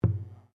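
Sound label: Drum Taiko Large piano, Japanese Taiko Drum; Miscellaneous Musical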